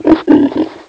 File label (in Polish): swinia chrum